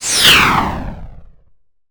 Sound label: cartoon plane fly past